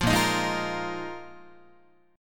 Bb 7th Suspended 4th Bb Guitar 4A,4E,5A,5D,5G sharp,6C sharp; strummed